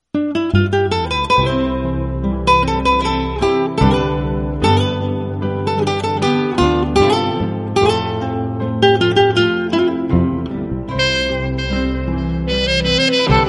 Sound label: sweet classical background guitar music tone